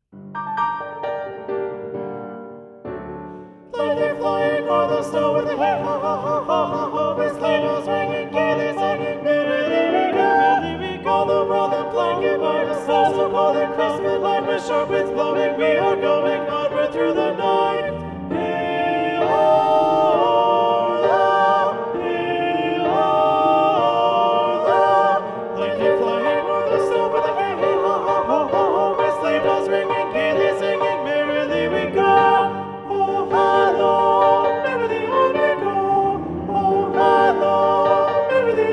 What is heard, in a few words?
ssa balanced voice; a la russe